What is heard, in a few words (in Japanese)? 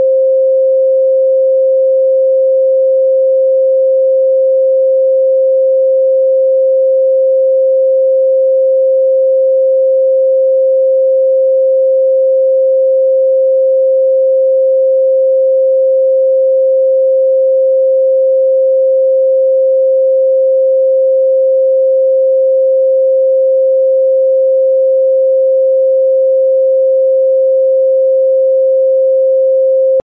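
528 Hz, ソルフェジオ周波数 信号音; 第3チャクラ 黄; 理想への変換、奇跡、細胞(DNA)の回復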